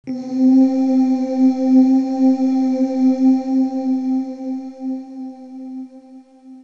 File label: voices pad